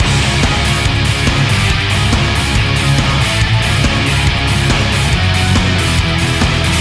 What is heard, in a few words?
music